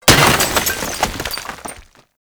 Assassinate Barricade Destruction Small; Warframe; Nineteeen Ninety Nine

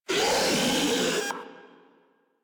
Proto Infested Ancient Player Spotted, Vocals

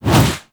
Generic Weapon Aim Transition In; Warframe; Archwing

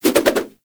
Nunchaku Spin Whoosh; Warframe; Tenno

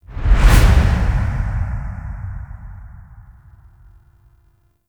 Zoom Whoosh; gameplay; Warframe; NineteenNinetyNine; Stage11FuturePast

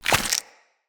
Agaric Mushroom Grow; Resource Materials

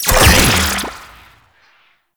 Shock Rifle Primary Shot, Weapons; Warframe; Corpus; Shock Rifle Vandal

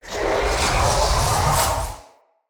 Casting Device Charge Heavy

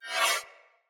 Sword Whoosh Accent; Weapons; Player; Swords; Fey Sword

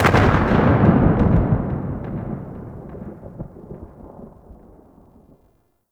Archwing Space Explosion Dist; Warframe

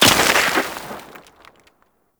Trench Small Dirt Impact; Gameplay; Warframe; Tau

